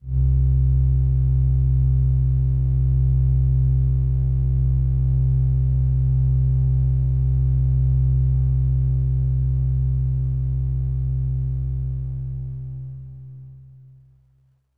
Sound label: Overlay Drone; Savant Extraordinaire